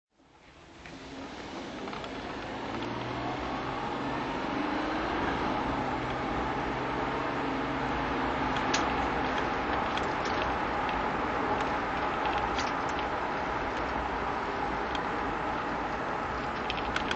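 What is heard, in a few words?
jaguar XJ12 drive, Engine sound driving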